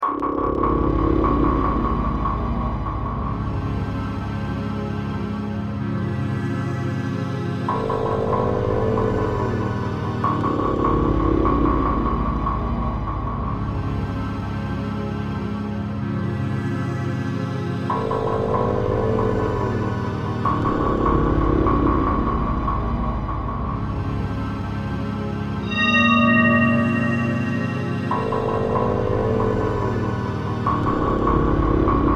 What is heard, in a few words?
94 BPM